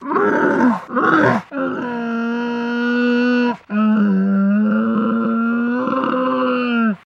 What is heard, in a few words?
Camel Separation Anx, camels